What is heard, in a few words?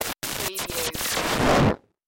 Scifi Production Element - Severe Transmission